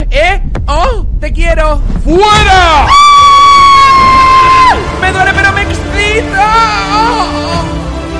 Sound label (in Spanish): fueraa aaaa